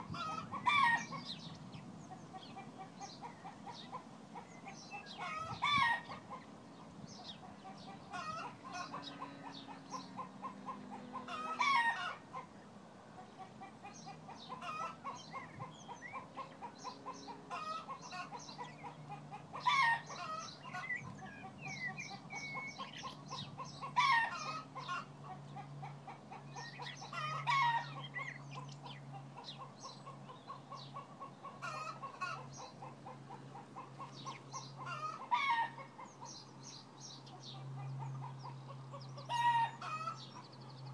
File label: sun shining chickens laying birds tweeting cheshire east ambience